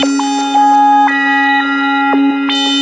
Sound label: bell groover, synth and electronic loops